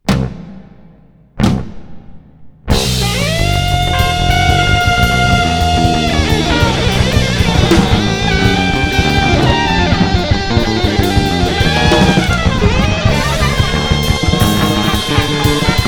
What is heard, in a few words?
Crazy Free Jazz